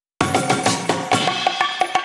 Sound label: Fill 128 BPM; Vengeance Samples; VEH1 Loops